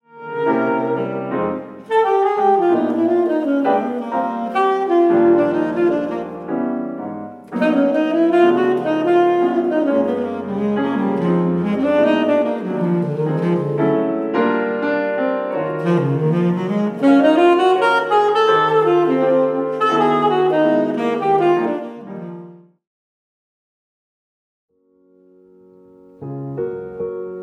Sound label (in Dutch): Saxofoon piano samples